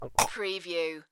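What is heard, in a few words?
Quick Choke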